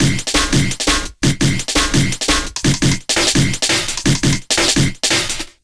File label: Hardloop, Drum & Bass; Fluid Dynamics